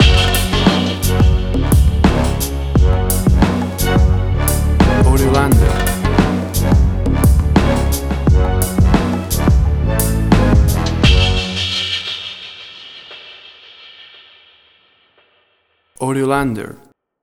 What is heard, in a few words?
Turbulent Orchestral Hip Hop